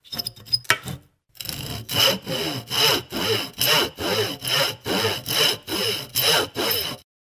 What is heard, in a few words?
Handsaw cutting wood